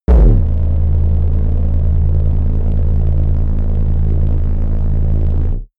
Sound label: space core drive start, Raumschiff